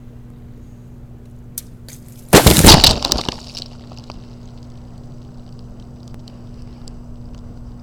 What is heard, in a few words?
Water Whack